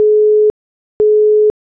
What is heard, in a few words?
Busy